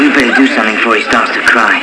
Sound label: cry; Debutante